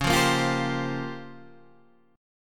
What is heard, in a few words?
A sharp Minor 6th Add 9th C sharp, Guitar chord